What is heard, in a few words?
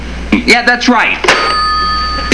slam